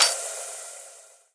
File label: grenade gas